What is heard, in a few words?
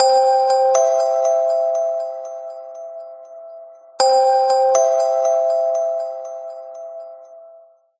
outgoing call ringtone